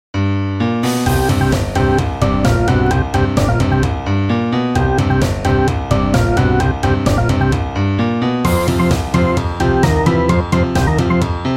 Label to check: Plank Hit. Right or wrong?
Wrong